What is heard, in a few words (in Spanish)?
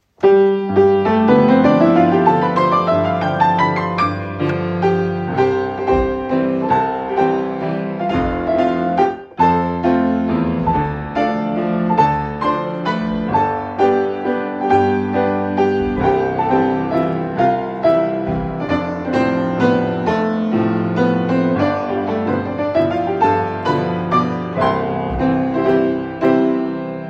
Musica Varones Grupo